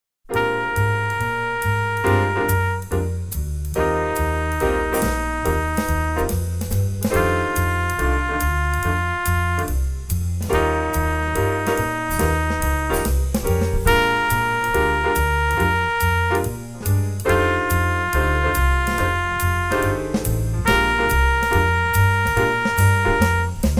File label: Recognizing Chord Roots I IV V